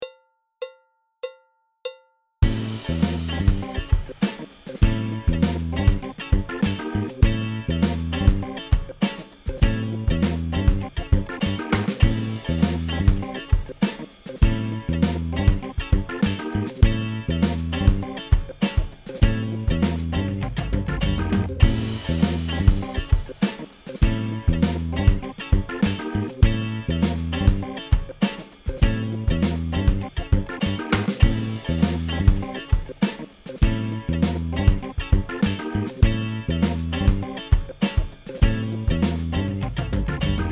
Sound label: Backing Track